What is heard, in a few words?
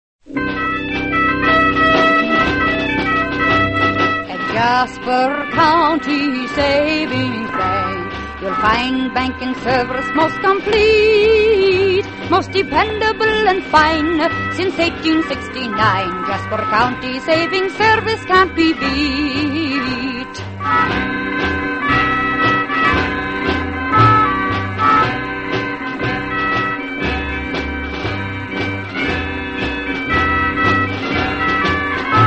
radio jingles; jasper county savings; allied radio artists